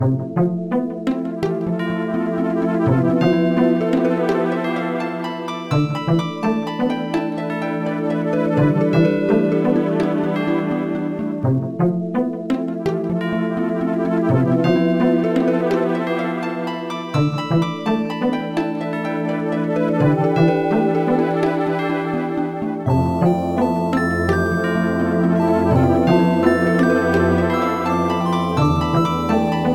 menu; PokeRogue

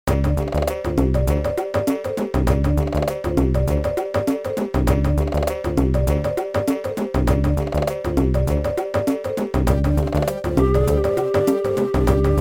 jungle, bgm; PokeRogue

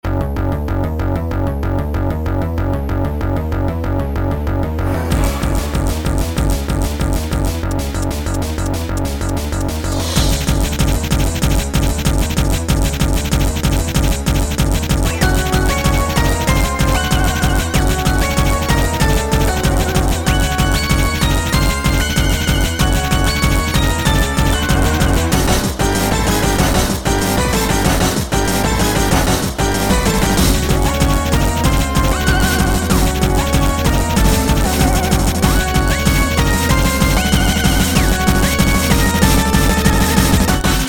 battle elite; PokeRogue

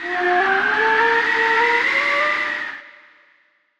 Sweet Scent; pokerogue

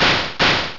Double Hit; battle anims; PokeRogue

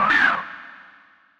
throw; battle anims; pokerogue

